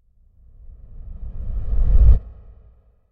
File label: Whoosh Reversed Sub Air; Hollywood Edge; sound designer's toolkit v2